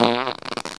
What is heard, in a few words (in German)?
furz